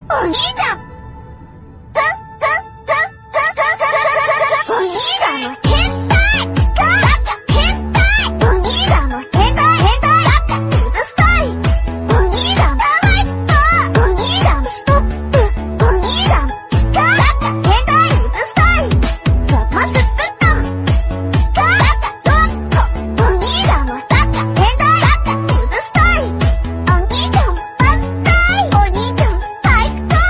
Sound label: Japanese Song Ringtone